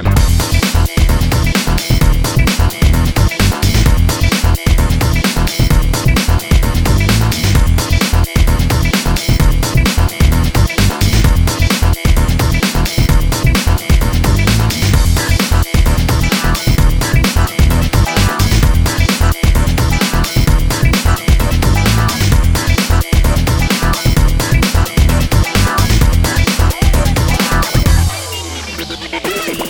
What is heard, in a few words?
jingle